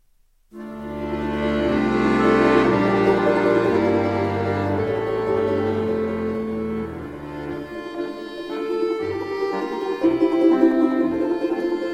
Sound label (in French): Russie; Vosle Sadu